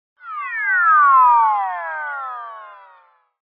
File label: Comic Slide Long Going Down; Hollywood Edge; sound designer's toolkit v2